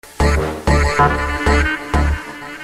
Balkan Sms

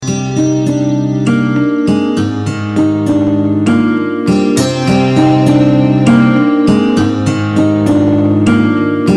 Guiter

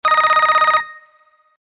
Oldschool Motorola

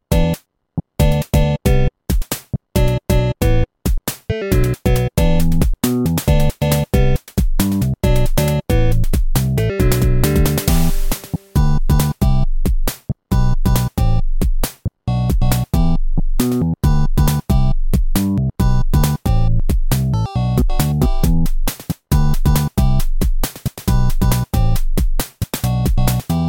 8bit

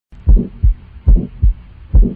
Heartbeat

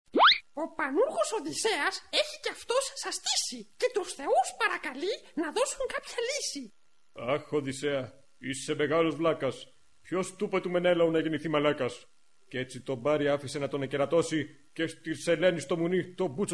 Belch

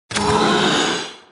Power Up Grab

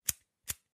LIGHTER FLICK

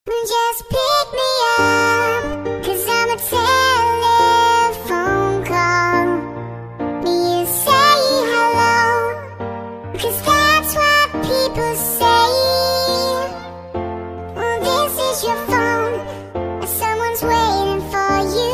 Cute Love Song

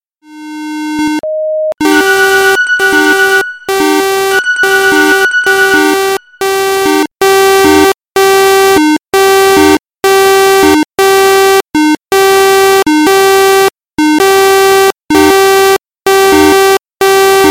Extreme Alarm Clock

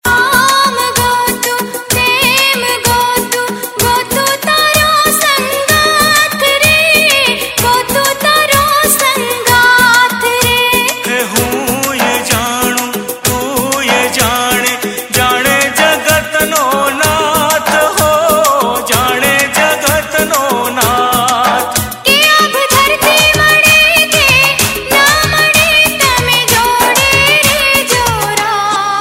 Gujarati Ring Tone

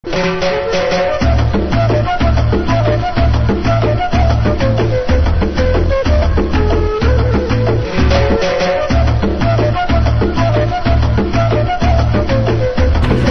Flute Song

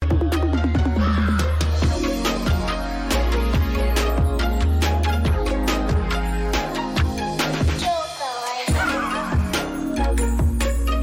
Kawaii Magic

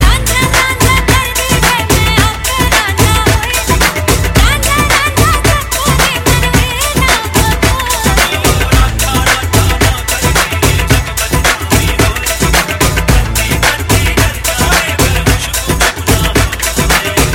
Dhol Mix

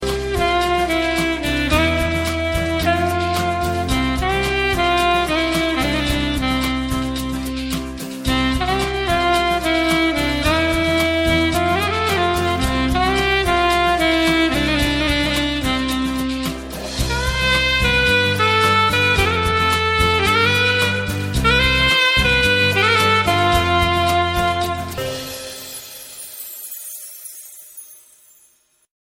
Soothing Saxophone

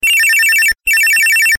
Ring

